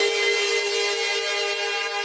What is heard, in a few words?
synth main